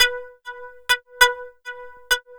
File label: SYNT, funk; USB Soundscan vol.47; AKAI; Hip-Hop & R&B